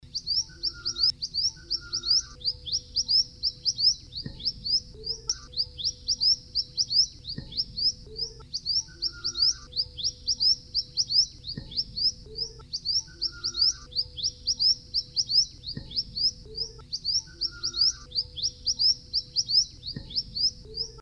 suara sepah hutan

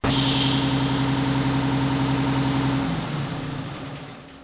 suara mobil

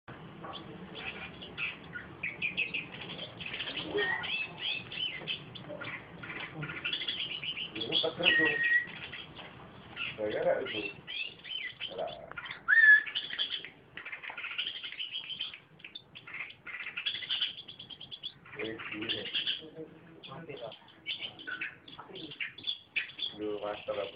suara burung kolibri

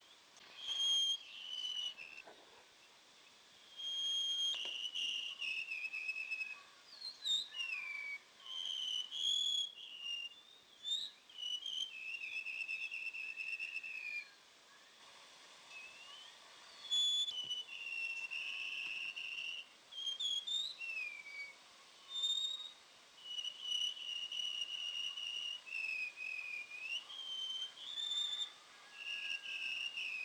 suara murai batu hitam

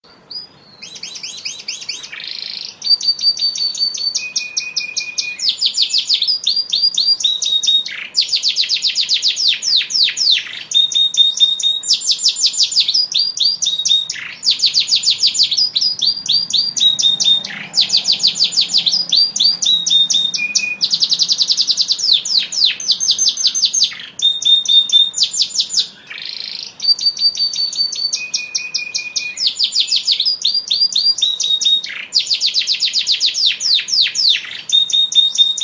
kenari yorkshire

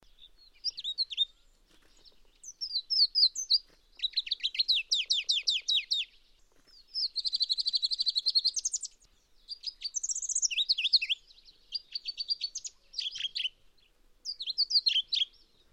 cikrak pallas

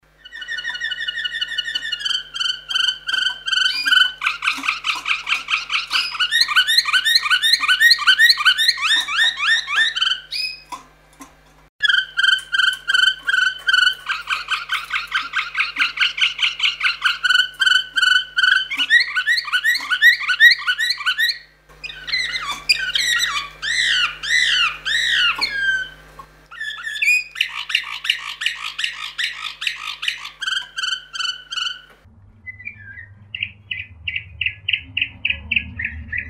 kombinasi slavujar orpheus

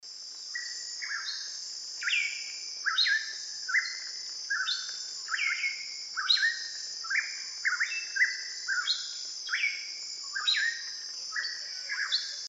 suara pitohui raja ampat

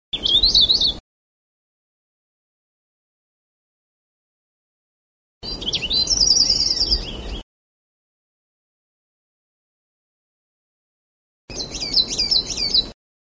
kicauan cikrak sulawesi

